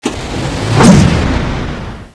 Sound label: attack, scorpion